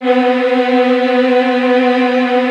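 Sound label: violin trills; ILIO; Kirk Hunter Virtuoso Strings VOL-1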